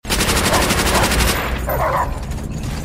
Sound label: Automatic Gun; Cod 5